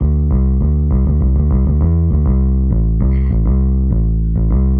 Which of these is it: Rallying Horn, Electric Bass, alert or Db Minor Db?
Electric Bass